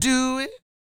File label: SING W, Vocal Planet Blues & Soul, BLUESY; ILIO